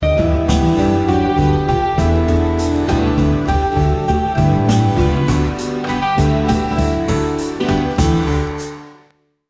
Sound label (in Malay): muzik terkejut, shock